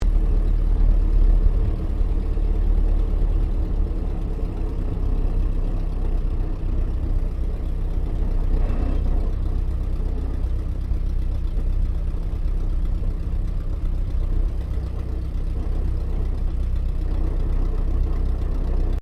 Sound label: AIRCRAFT DH82A DEHAVILAND TIGERMOTH IDLE INTERIOR; RAAF Tigermoth; Airplanes (Sound Effects:Vehicles)